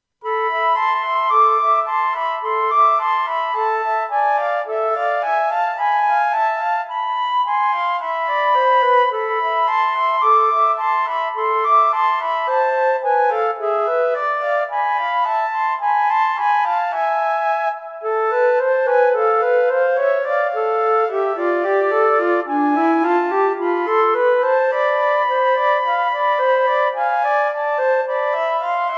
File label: Albania folk music